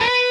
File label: Bend full